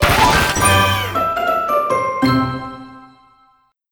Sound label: Crunchy Finish